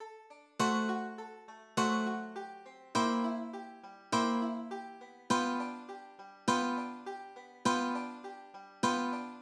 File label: Backbeat chop mandolin